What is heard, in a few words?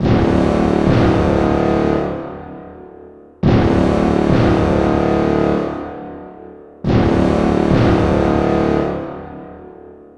Combat